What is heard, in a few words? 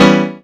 PIANO; SoundFactory